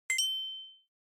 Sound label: Inspiration High; ひらめき